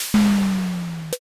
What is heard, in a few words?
train door